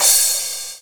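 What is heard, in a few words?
cymbal crash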